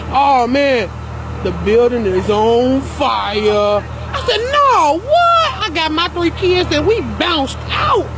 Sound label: bounced; valve